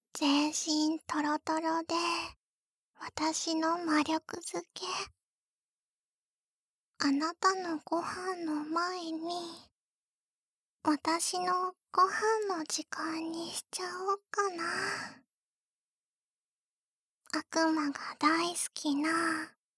両耳舐め; 催眠音声-ロリ嫁サキュバスの無限搾精; 暗中模索; 男性向け

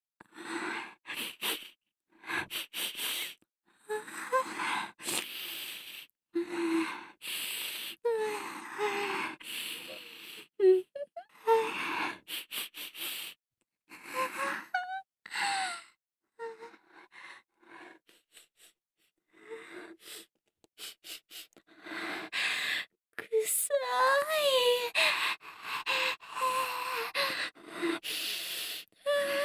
ちゅぱ音のみ; 豚マネフェラチオ編

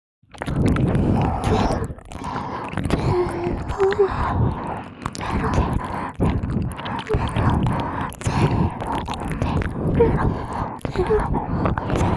お知らせゼロ両耳舐め

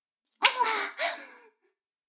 フェラ音声; 逆時間停止されて

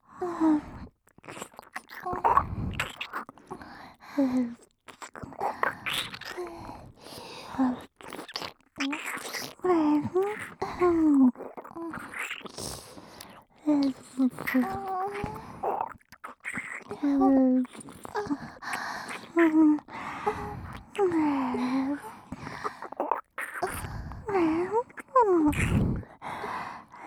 両耳舐め; おまけ 魔王と姫の両耳舐め(リピート対応); Hシーン水音SE抜き

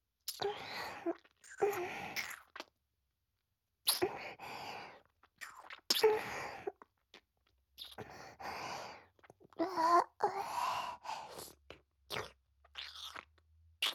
耳舐めエッチ リアルセックスバイノーラル処理無し; ロリサキュバスと耳舐めエッチ

